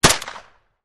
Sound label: MP5 single, Submachine Gun MP5 Single Shot; Machine Guns; VRSonic